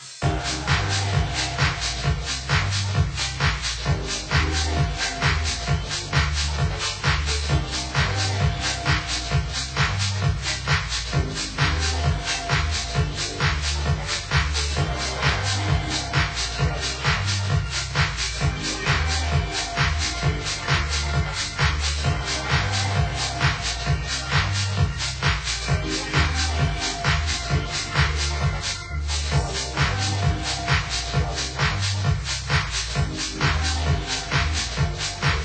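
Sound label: Music Ambient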